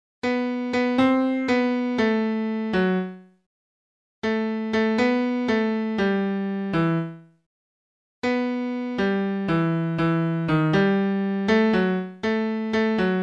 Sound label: Pilem pilem midi; SAT